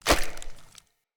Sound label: Foley Melee Swing; Enemy; Characters; Corrupted Sproutfolk